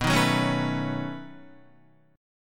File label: B 9th B down Guitar; B9 strummed; JGuitar